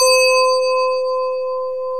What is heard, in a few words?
DIGITAL SYNTH; OMI - Universe of Sounds